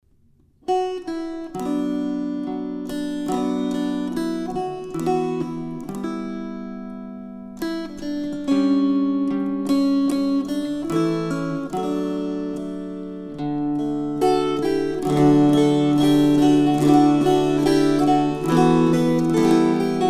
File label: String Choir